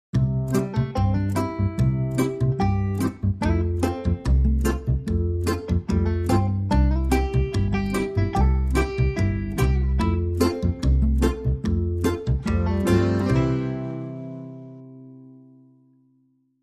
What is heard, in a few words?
Bavarian Folk